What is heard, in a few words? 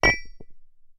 Hammer or Mace